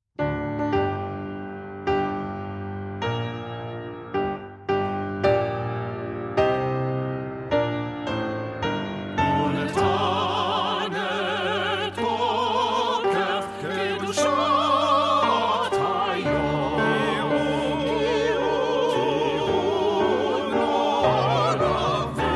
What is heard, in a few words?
unetaneh tokef piano and choir